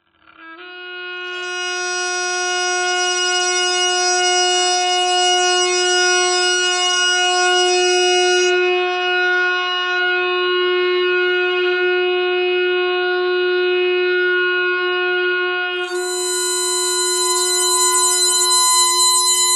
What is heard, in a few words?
bagpipe thread noise